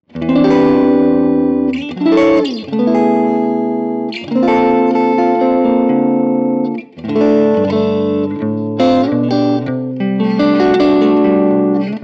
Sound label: characteristic sound; jensentone